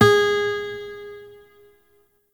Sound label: Shawzin Oct Two G Sharp; Warframe; Lotus Shawzin